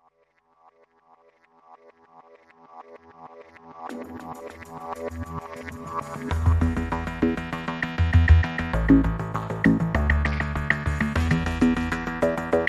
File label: Ambient Dub